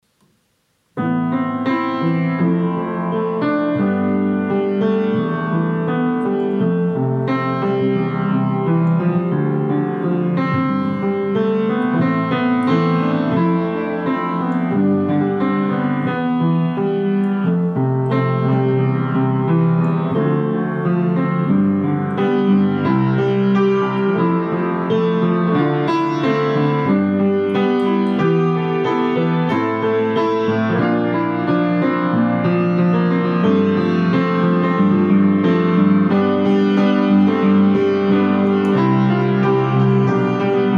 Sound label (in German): klavieremotionen